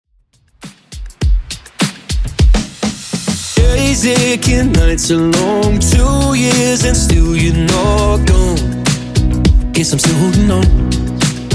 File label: Top40 DJ Friendly Redrum 102bpm